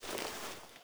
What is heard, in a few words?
draw, weapons m14; M14 Reanimation; librarian m14